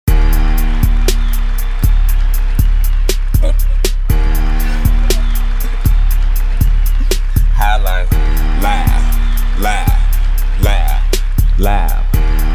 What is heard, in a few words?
Highlife Loud